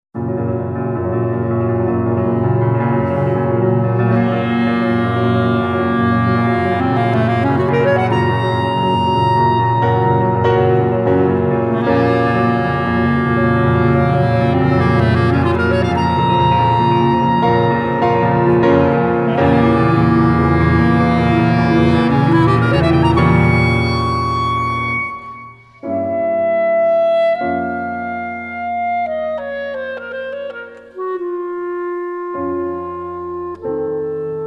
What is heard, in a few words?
Grand Duo brillant Introduzione Maestoso